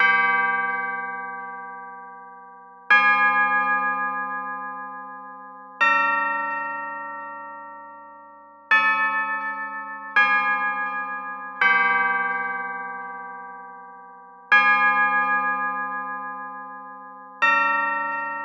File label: tubular bells